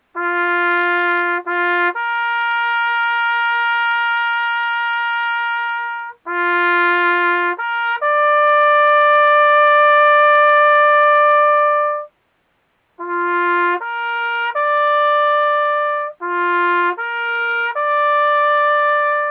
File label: Bugle Call Taps